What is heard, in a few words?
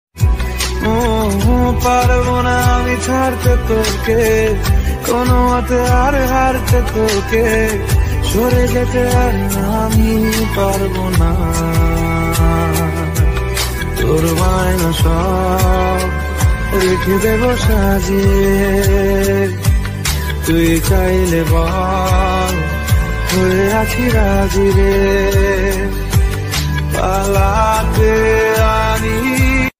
Bengali Ringtone